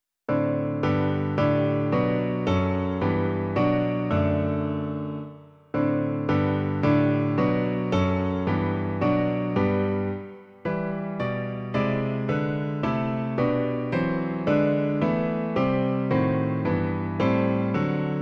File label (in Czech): klavír; Klavírní verze; Hospodine hodný chvály